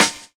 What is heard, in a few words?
SNARE; E-Lab; X Static Goldmine 2; akai